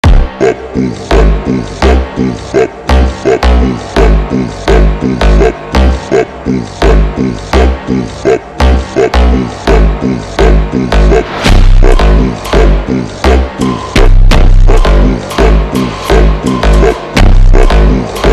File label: phonk super slowed reverb brazilian phonk